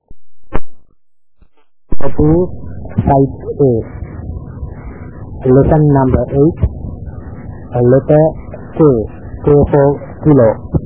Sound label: Morse Lessons